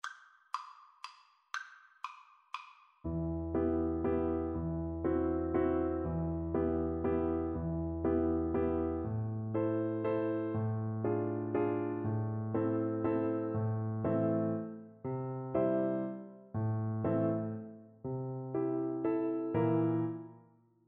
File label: waltz flute